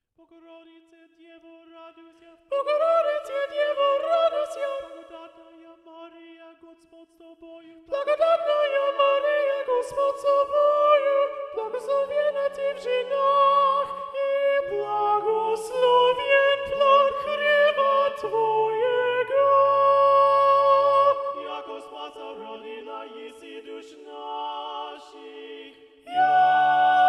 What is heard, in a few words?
Soprano 2 Predominant, chorale